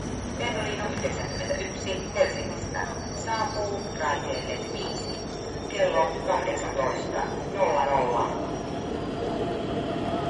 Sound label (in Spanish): tren